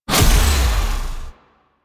Hoverboard Race Gate Hit; Warframe; DE; Vehicles; Venus; Hoverboard Racing

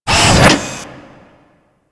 Frame Jump; Enemies; DE; Warframe; Vay Hek

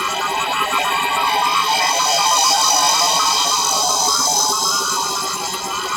Data Pillar Loop, Ship; Ambience; Props; Warframe; Corpus Ship Remaster

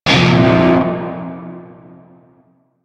Shawzin Hirajoshi Chord; Warframe; Narmer Shawzin

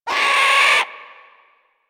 Bird Of Prey Vocal Scared C; Warframe

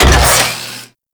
Saw Blade Launcher Mech; Warframe

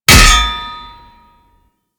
parry axe; Warframe

